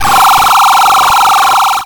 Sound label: science fiction weapon beam ray gun